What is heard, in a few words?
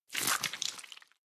Bloody gore squirting